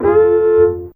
C Fiddle C3, Fairlight CMI IIe Pizz Str

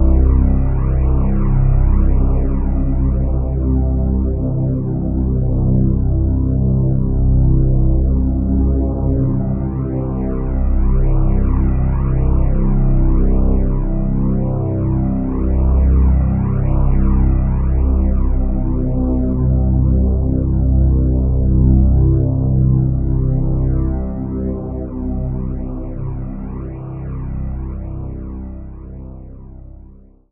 ALIENDRONE R, PADS FX

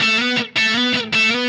Happy Pub Guitar

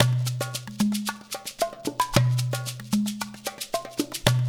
LOOP 3 R, Percussion; InVision Interactive; GRUNT

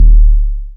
KICK R; Big Beat Samples & More; AKAI

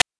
PITCHED NOISE; NES DPCM; MIDINES

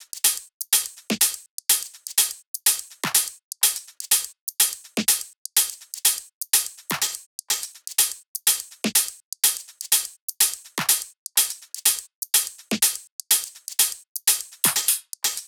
loop hat 124; Seismic Loops

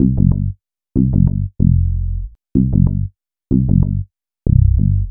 Filtered Funky Bass, Electric Bass; Sonic Foundry (Sony Creative Software); Leo Cavallo Bass Taster

